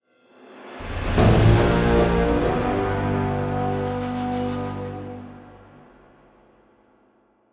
Battle Loop Lose; Dragon Mania Legends; Android

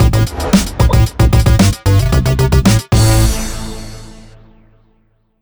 Win Music (with SFX synced)

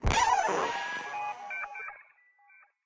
Cypig Destroyed

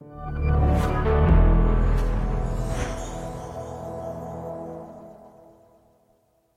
Completed; Rocksmith

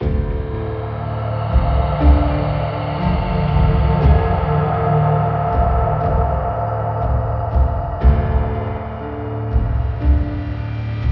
120 bpm; legrand legacy tale of the fatebounds; Reek Of Finias

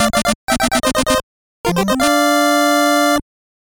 Pattern Clear; Contra Spirits 1996; nes

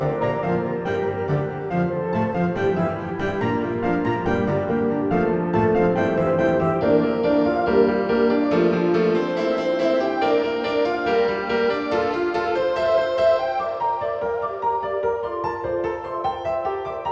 Song B Loop

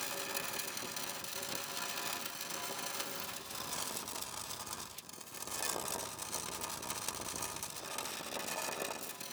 plastic slide loop; teardown; ps5 windows xbox series xs

